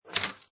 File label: Pinball Sound Ball Rolls And Hits Objects; Video Games